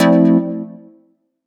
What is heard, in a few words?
stab clean F, Noise; stabs